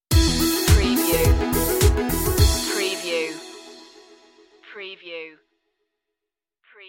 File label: boiling point stinger; electronica; melodic